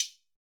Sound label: Hi Hat